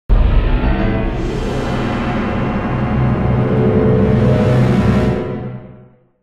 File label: Fight Intro; music; Louvre; The Da Vinci Code; XBOX